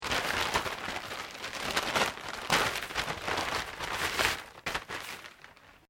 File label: PAPER BAG LARGE FOLDED